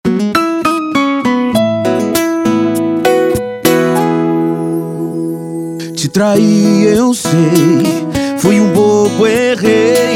sertanejo universitario sofrencia; Fabrica da Musica

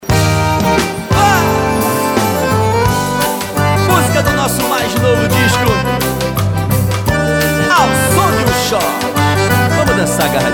ao som de um xote ao vivo

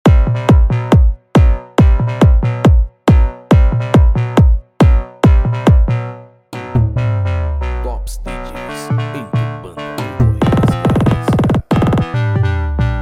pancadao automotivo

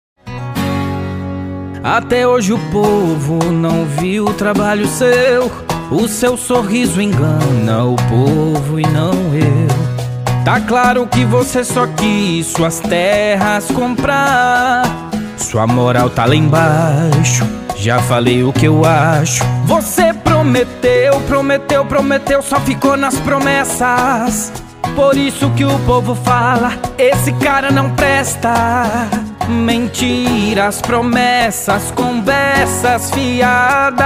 jingle; oposicao haja colirio; pre campanha

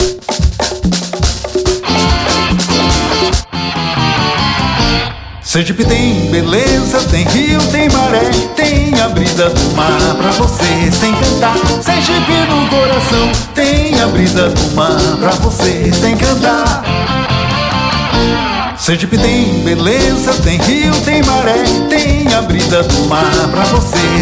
frevo

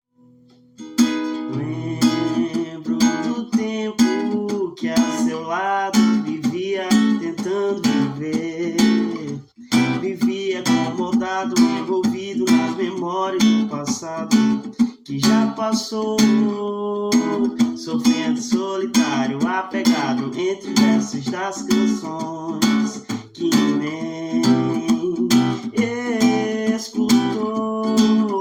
musica autoral

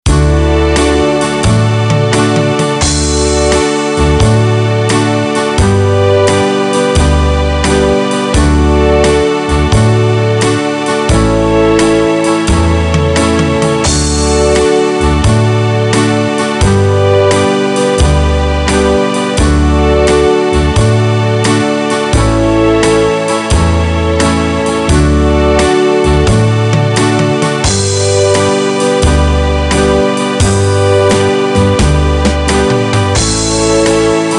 avenida coracao partido instrumental

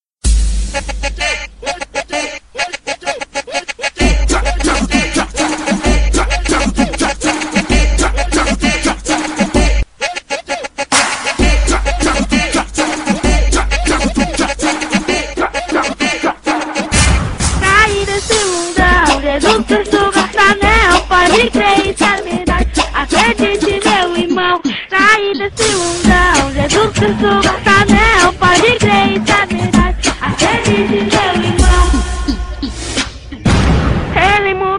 gospel funk

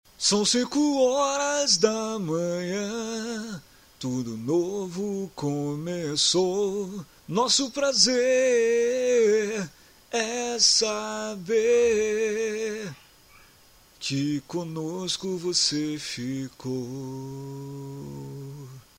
jingle

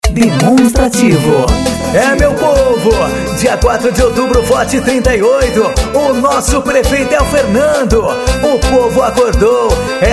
forro; Jingles Politicos 2020; Studio Lako

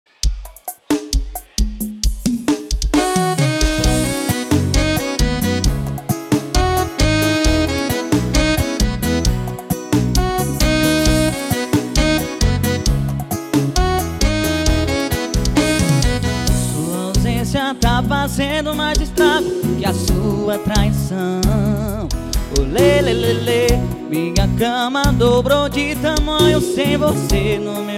arrocha

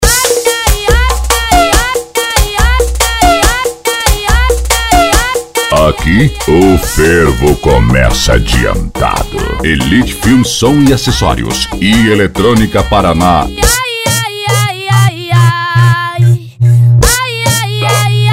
eletronica; parana edicao fervo 2016

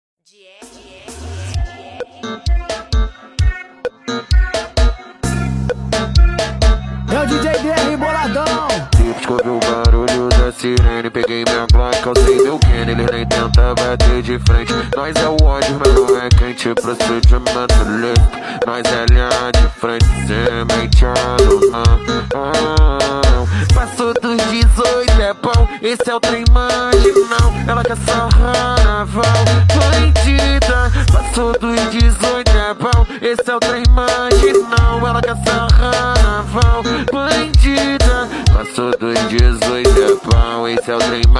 barulho da sirene, beat bregadeira remix

